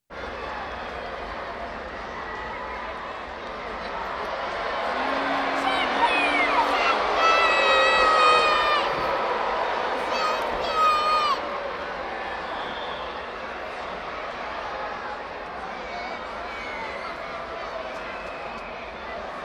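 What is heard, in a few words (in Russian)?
с криком ребенка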